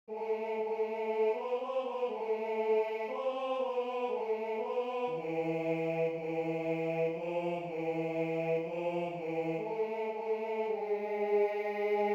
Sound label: Bass I; Varda che passa Bella Bimba